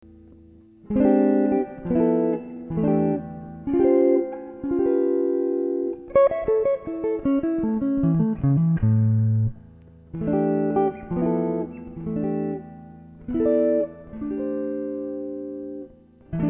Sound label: Happy Birthday Reharm, guitar